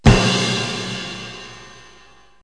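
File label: medium gong; music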